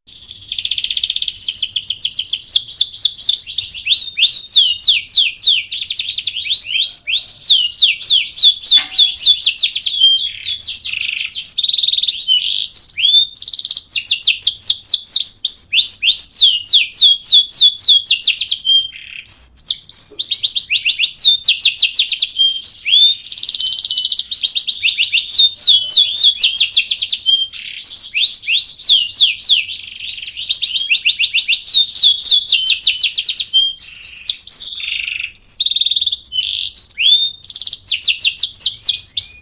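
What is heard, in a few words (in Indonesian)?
kenari bulldozer, canary; ys inggris 2013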